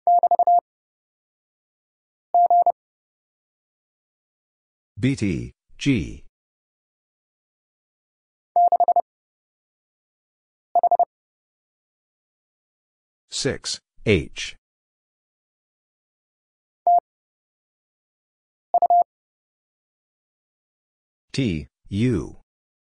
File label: BT Two Characters 30wpm; Lesson 36d